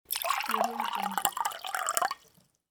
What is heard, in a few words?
pour water